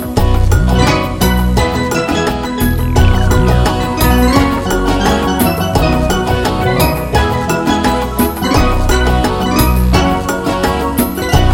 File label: A Great Limbo